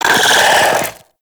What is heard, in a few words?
Arachnoid Wraith Vocal Attack Short; Warframe; Corpus